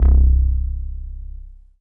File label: Synth Prophet Bass C; Electronisounds